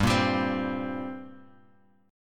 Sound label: G Minor Double Flat 5th, G, Bass Standard; strummed; JGuitar